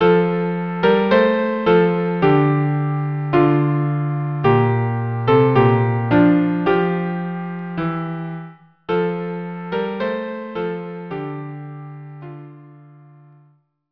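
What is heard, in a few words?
violin cello; In the Bleak midwinter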